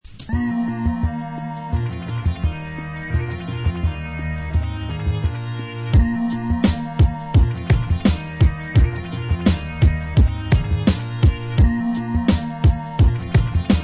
keyboard trip

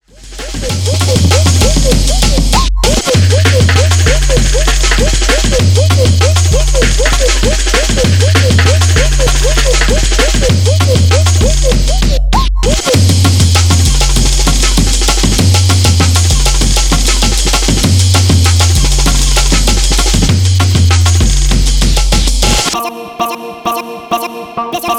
Ragga Bass; Adepta Editions

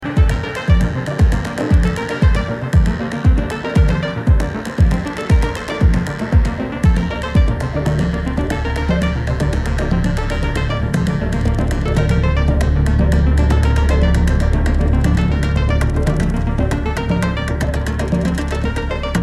Cymbals

